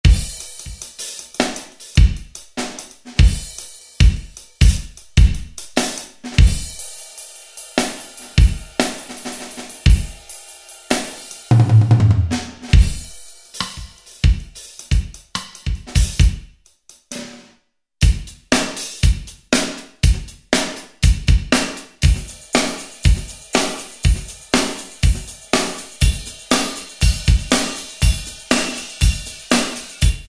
R&B Groove, Drumscapes; Northstar Productions Drumscapes Vol.1

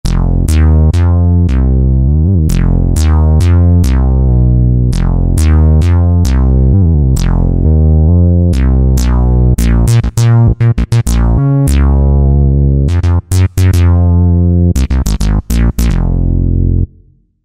Monster Bass, Korg TR-Rack

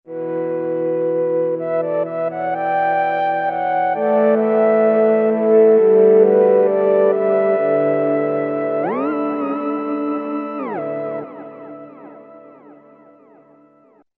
Mellow Winds, Roland V-Synth; Factory Patches

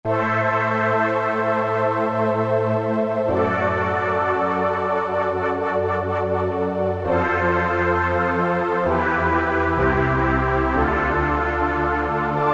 pad Richness, E-mu PK-6

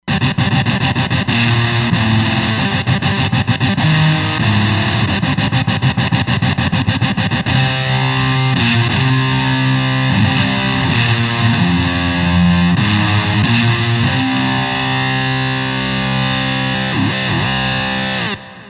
Punker, Roland Fantom XR

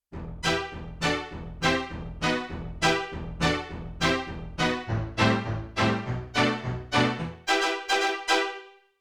Groovy Strings octa, Yamaha TX16W; Orchestral Performance 1

